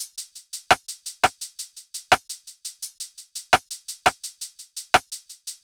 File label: Top Drum Loop, 170 BPM, Hip Hop; Drums; Humble Hip Hop Sample Pack; Thirst